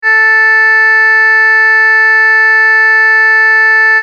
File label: oboe a, acoustic